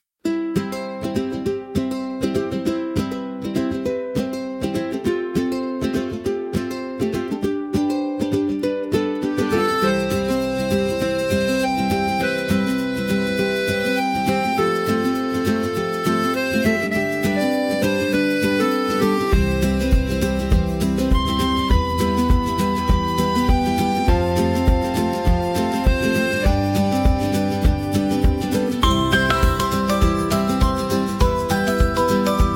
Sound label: fantasy music nature forest; ghibli style; wind healing nostalgia